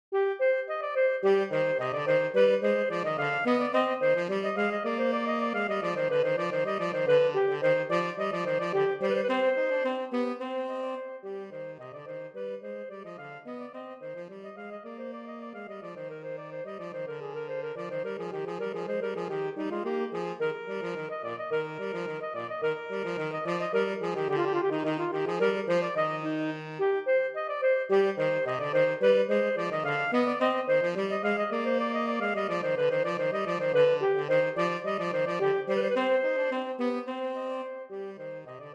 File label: Allegro Duo Sonata in C Minor; Loillet de GANT; Saxophone Duet